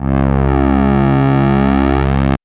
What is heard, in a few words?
MOOG; AMG - Vince Clarke Lucky Bastard